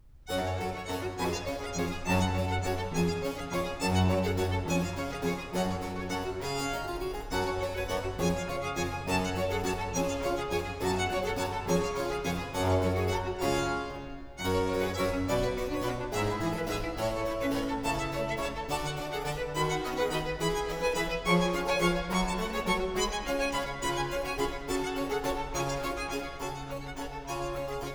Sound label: Trio Sonata for 2 violins & continuo in G minor, Capriccio